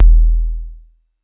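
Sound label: vermona drm1 mk3 bass drum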